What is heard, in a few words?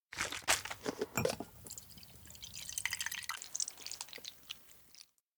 shaker shake pour; anemoi